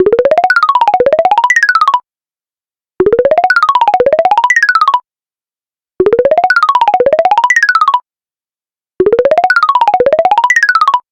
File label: Pleasant Running Up; LG Wing 5G Verizon